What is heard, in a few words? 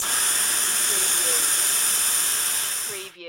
Pneumatic Valve